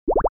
Keytone send; SU780 Lollipop 3; LG

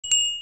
Camera; LG

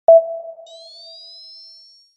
Charge Activate; Balmuda

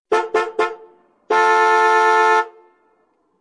Hoot; Qiku; Luna Youth

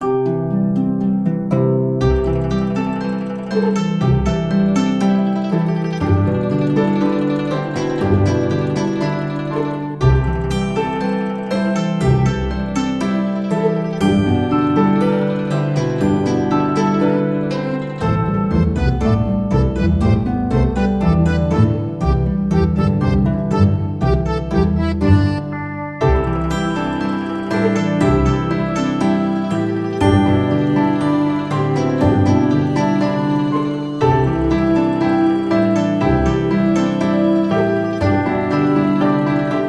Ringtone RedThread; Acer Liquid E1

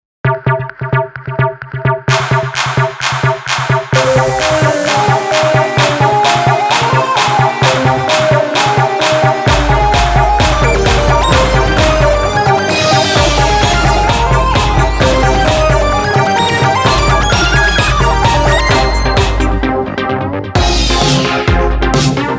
EDM; i-mobile; i-style-711

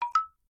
Voice Record Success; IUNI; ui; IUNI OS 3

